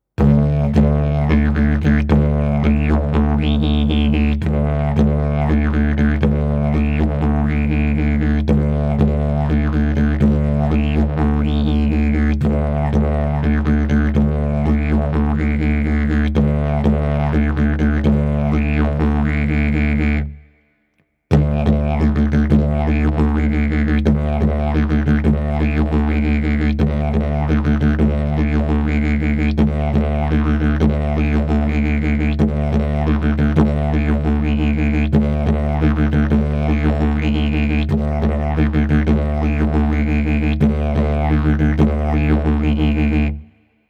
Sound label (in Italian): ritmo livello intermedio